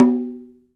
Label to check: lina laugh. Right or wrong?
Wrong